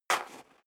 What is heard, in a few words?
step high heels gravel light single stair down; schritte; Footsteps